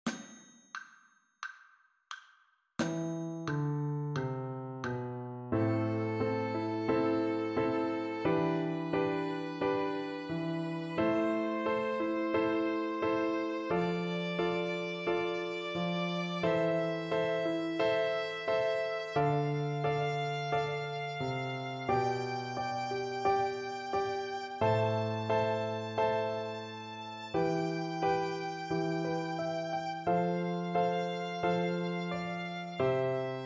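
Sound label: A natural minor scale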